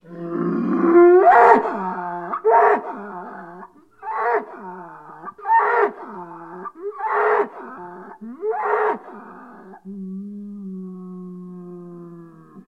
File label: Cattle, Cow Bellow, Yell. Multiple High-pitched Bellows, Medium Yells, In Pain. Medium Perspective.